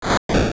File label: Shovel; Digging with the Shovel